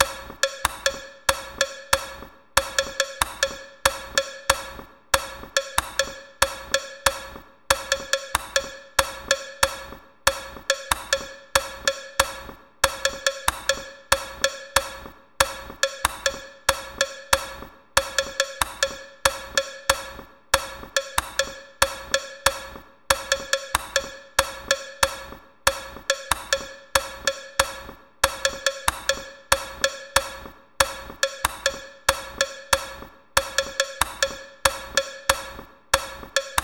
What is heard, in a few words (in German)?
Glocke